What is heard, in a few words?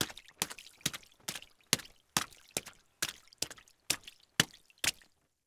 Step Water Splash; Footsteps; HauntedBloodlines; SFX Producer